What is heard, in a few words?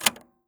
LOADING, SWITCHES; AKAI Anime Sound FX